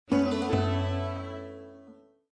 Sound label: Windows Logoff Sound